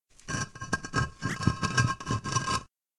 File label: Medium Rumble Walls; SyrinScape; Hunting horror battle